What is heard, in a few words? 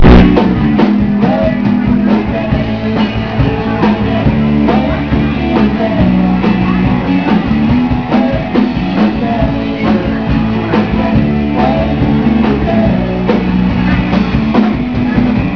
band crowd